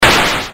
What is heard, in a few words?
explosion; Asteroids-Reloaded